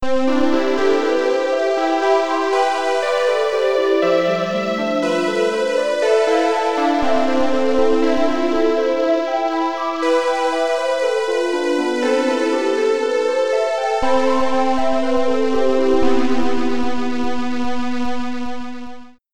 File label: sleepy music, chiptunes